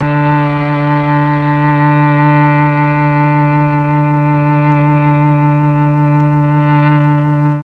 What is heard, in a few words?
D3 mellotron cello